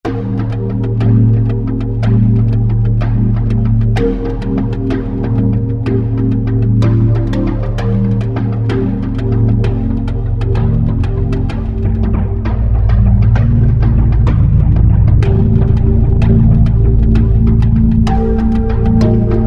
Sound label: ME Templ, Yamaha TG500